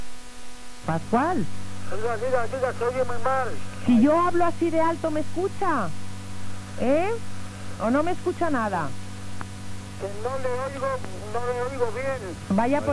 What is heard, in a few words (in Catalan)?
campana